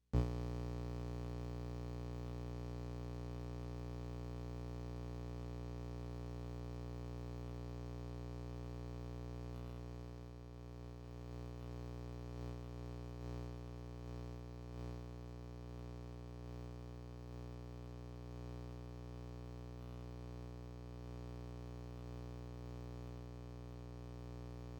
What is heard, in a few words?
Cable Noise